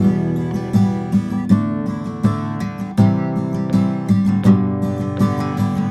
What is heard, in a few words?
EM GTR, Celtic Flavours